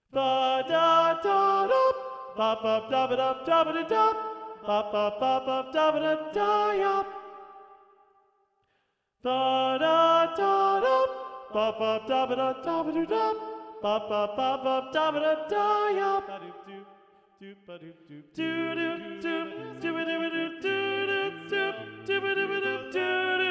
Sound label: Soprano; Practice Room